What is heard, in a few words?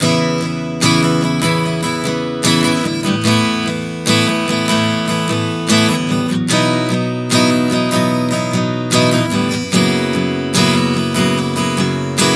Progression 074 C#m7-Asus9-B-F#11#, Acoustic Guitar, Chord Progressions 074 bpm; Sonic Foundry; Songwriter Acoustic Guitar Companion